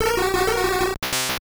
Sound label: cry drowzee